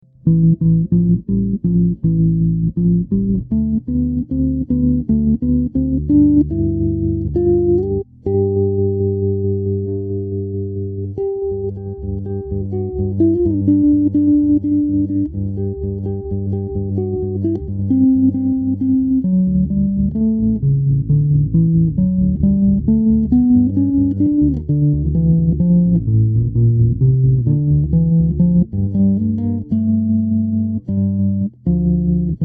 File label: pizz